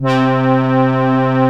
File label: SIEL, String Machines